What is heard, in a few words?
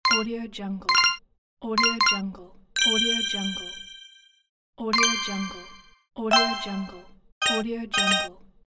scoring a point